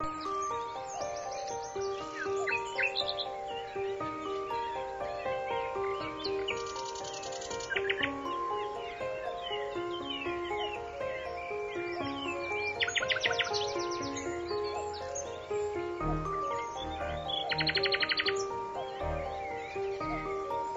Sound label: backing